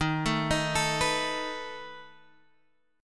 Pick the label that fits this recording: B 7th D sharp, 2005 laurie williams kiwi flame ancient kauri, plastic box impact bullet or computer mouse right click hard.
B 7th D sharp